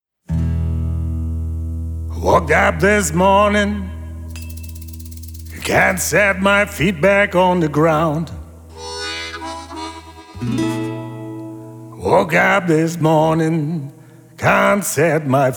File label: pistol blues unplugged